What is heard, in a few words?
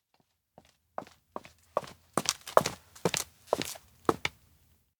steps return; anemoi